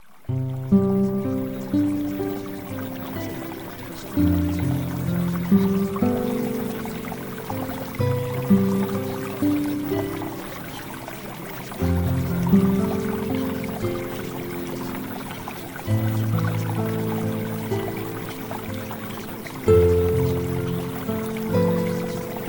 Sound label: Music